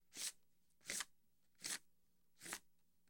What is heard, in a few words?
Cards Slide From Shoe